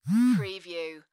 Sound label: Cell Phone Vibrate